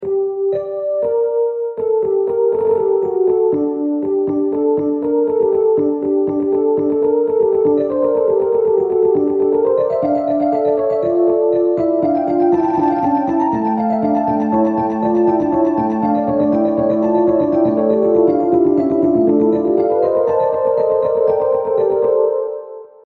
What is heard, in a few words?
Ele Piano